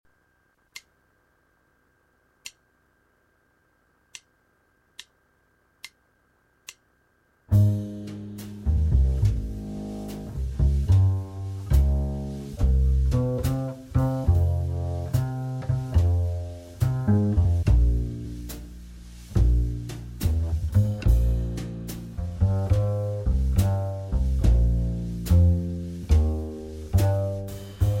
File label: Backing (Ab), jazz